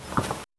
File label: walking in boots quick; sound effect